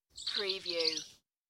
UK Birds; beanstalk audio

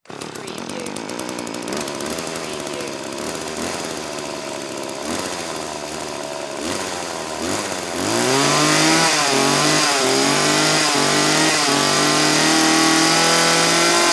Chainsaw Pull Start

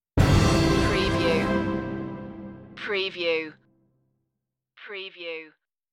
warm summer day stinger

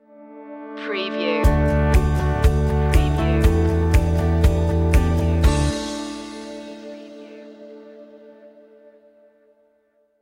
all good people stinger

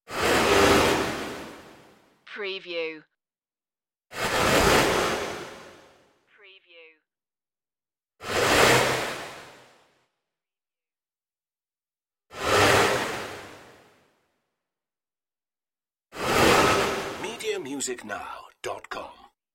fast flyby whooshes

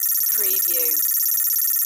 Data Beeps Readout

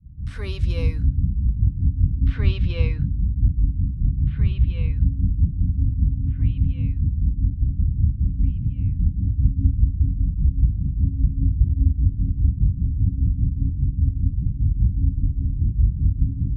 Power Drone Throbbing

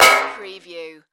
Impact Metal

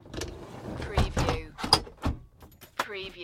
Helicopter Door Close

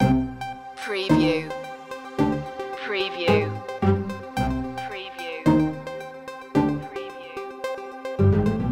tango chill loop

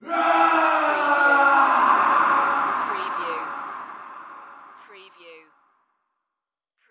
MALE SCREAM REVERB ECHO

